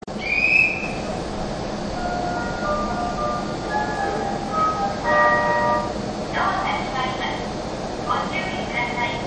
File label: melody